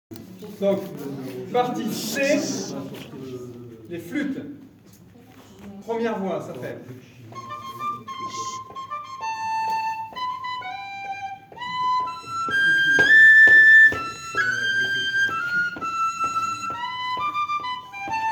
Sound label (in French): flutes; La Destimborlada